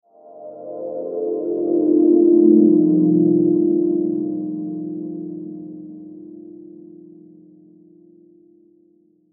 Whoosh Shimmer Swirl Ascend Sweeper, Whoosh Shimmer Low; Blastwave; Whoosh Sound Effects